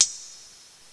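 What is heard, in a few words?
Cymbal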